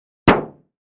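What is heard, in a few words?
Car Hood Hit Sledge Hammer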